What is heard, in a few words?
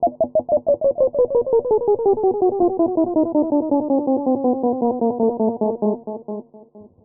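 axe attack, 120 bpm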